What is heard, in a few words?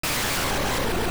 death; SpaceForce